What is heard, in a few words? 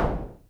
SYNCUSSION